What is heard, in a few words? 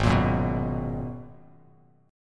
Ab Minor 9th down Bass Standard; strummed; JGuitar